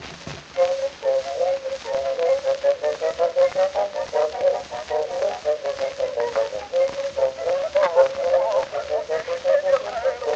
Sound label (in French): Fanfare